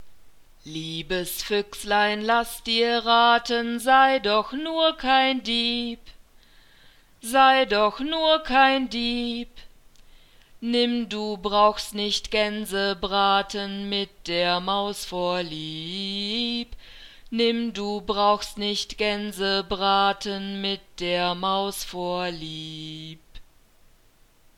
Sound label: nursery rhymes fuchs du hast, German